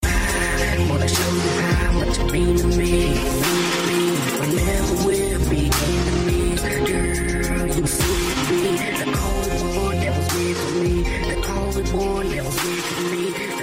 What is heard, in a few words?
Ringtone